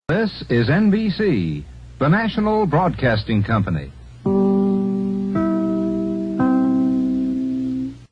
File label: nbc chime sig